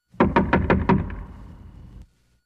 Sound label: door ringtone